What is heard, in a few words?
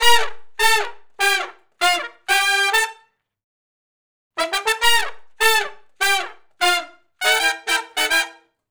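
Funk Riff (C) unison, harmony, Horncraft for R&B Sections; Sony Creative Software; Crimson Blue and Fabulous Horncraft 4 RnB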